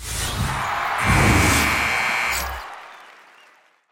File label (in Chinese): 比赛结束; 战中; nba